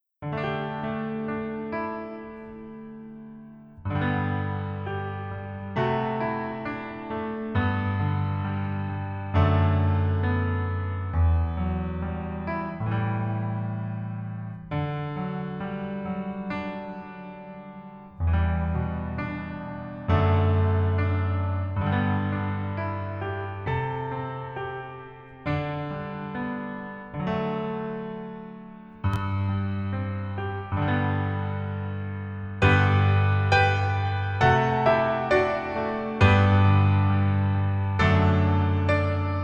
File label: Piano ver